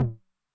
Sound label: Med Tune Short Decay, 909mod MID TOM; classic machines; MT - MID TOM